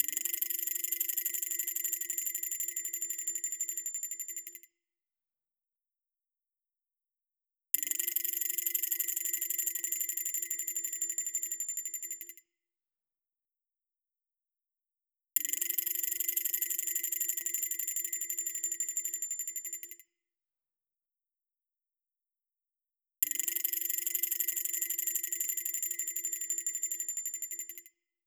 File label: drums rattle